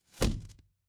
ui drag

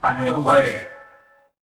feedback achievement

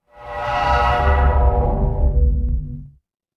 magic scifi alien

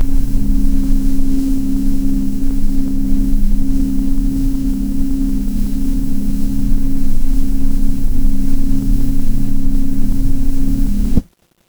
ambience room tone

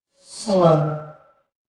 ui send

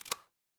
ui unlock

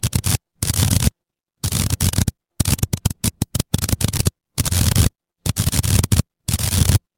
fire electric arc

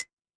glass glass tap

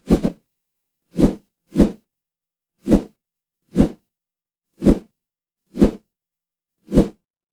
fire electric whoosh flame

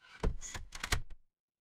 door open

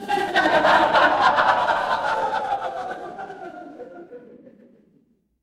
crowd laughter

